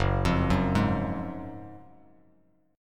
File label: Gb Major 7th Suspended 2nd, down, Bass Standard; arpeggiated; JGuitar